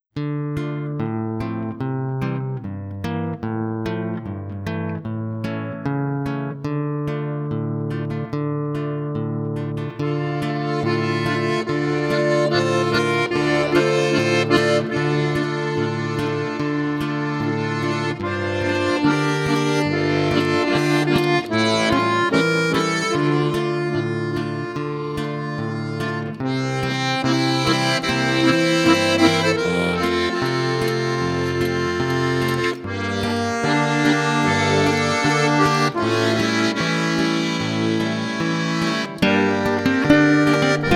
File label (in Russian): duet dlya gitary i bayana